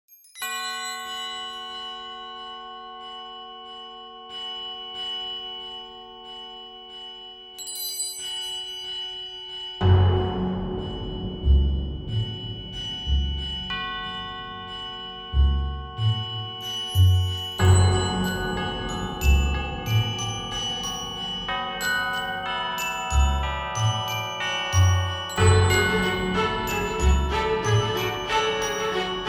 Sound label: Carol of the Bells instrumental